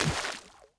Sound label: body roll water, foley